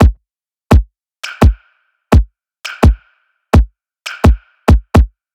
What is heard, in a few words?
170 DRUMLOOP